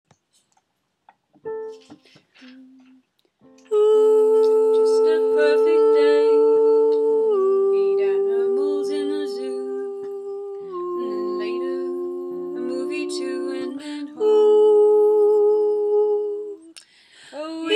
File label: lower accompaniment